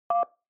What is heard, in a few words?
Telephone DTMF 1